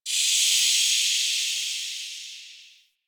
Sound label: steam blast; Warframe; Ambience; Hub; Tenno Junction Relay